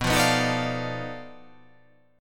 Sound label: B 7th Sharp 9th Flat 5th B down